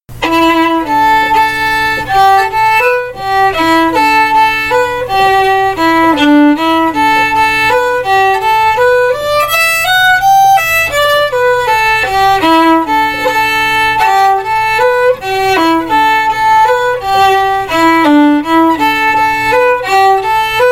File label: slow; SportingPaddy